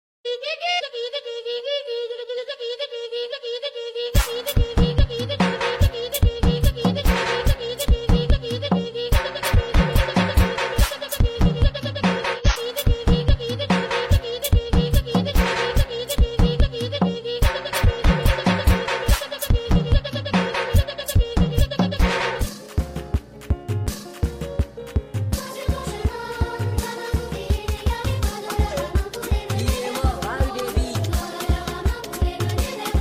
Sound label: CRUISE BEAT